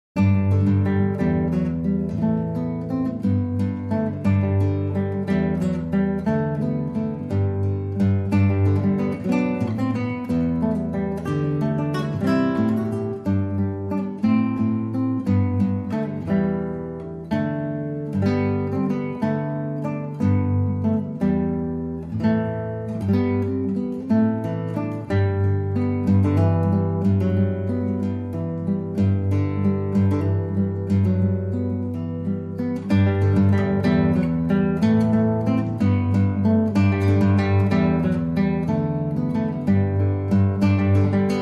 Solo Guitar

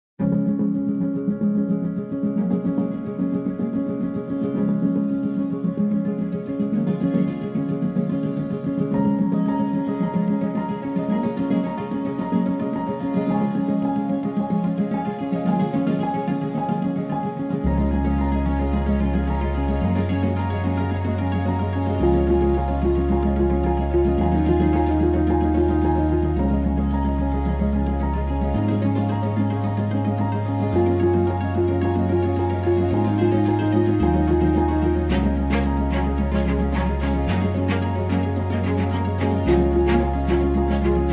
No Lead Piano No High Strings

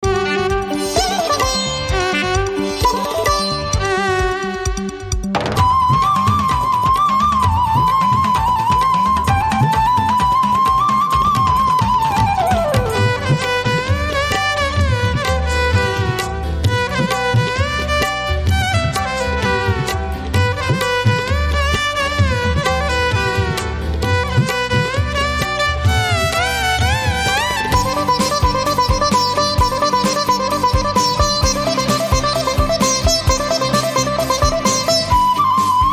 Punjabi Party

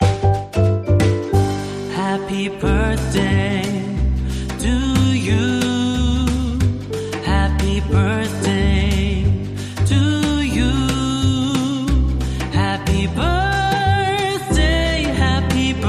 Male Jazz Vocal Happy Birthday

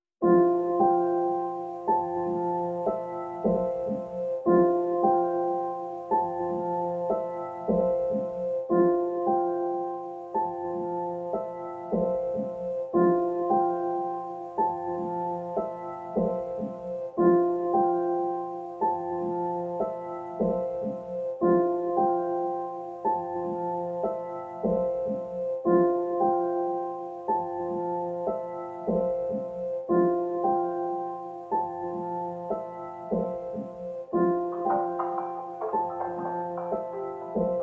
Piano Loops Mix

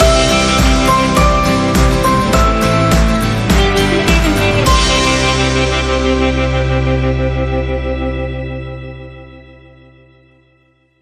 Sting No Vox

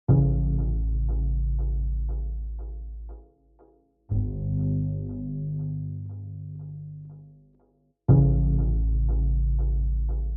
Drums and Bass

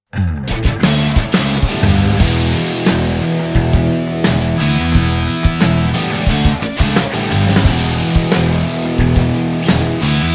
No Lead and Solo Guitar

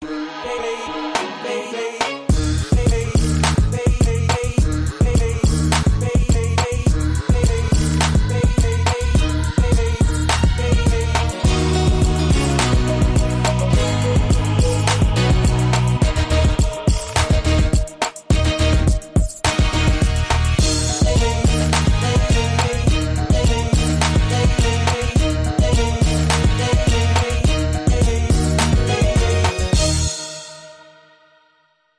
Trance

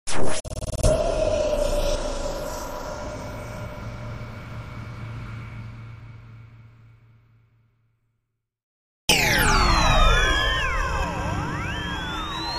Crater Hit Danger Hit

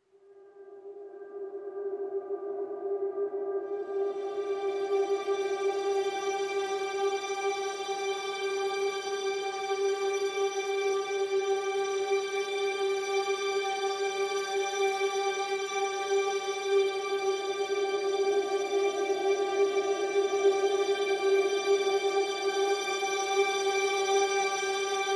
Pads Ghostly Whispers (G)

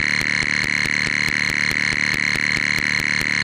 Pulse Flickering Lights

